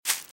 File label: Velociraptor - Footstep - Grass - Run - Right